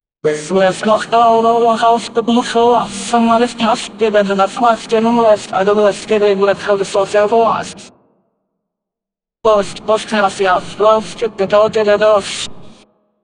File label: chant